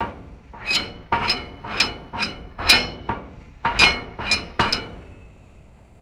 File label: repetitive iron crossbar clanking and squeaking